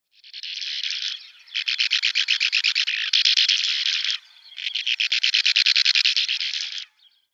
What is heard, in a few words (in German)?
Uferschwalbe Riparia riparia; Vogelstimmen